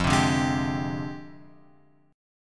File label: F Minor 6th Add 9th, chord; Fm6add9 Chord {1 3 0 1 X 3} strummed